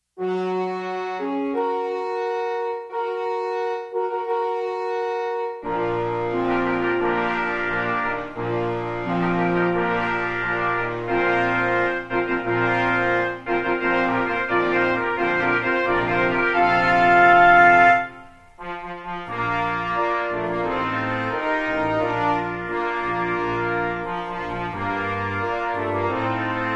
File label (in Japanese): 開幕のファンファーレ（金管合奏）